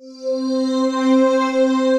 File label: Pad, Synth Pad; Pad Me Closer